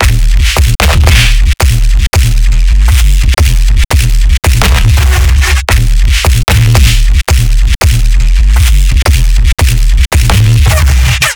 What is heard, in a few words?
169 BPM D sharp neuro, bass